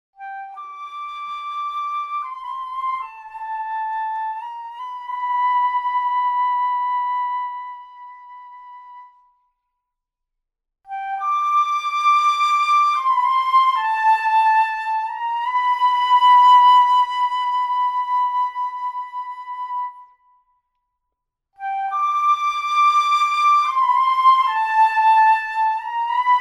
Symphonic Woodwinds Flute

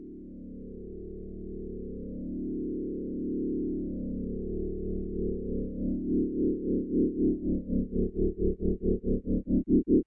Realtime Knobs; LunaMare

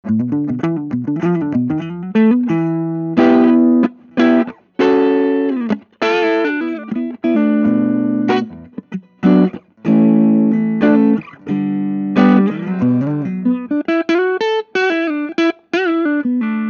Crunch Bluesy

